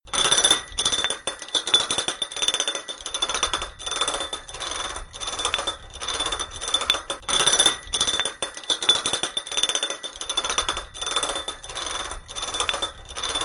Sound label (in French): Bouteille verre